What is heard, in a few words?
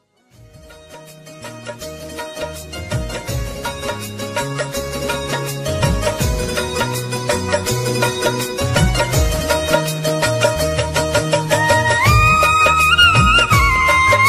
krishna ringtone bansuri ringtone instrumental ringtone murali ringtone